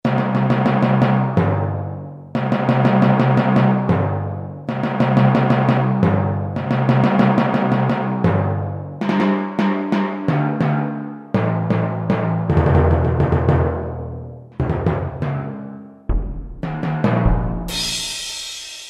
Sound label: Timpani & Perc; Kurzweil K2000 Orchestral ROM